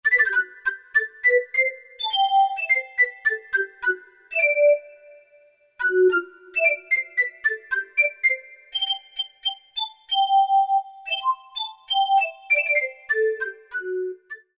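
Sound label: pipe chiffer; E-mu Proteus FX; Factory Presets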